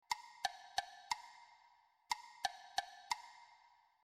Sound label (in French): rythme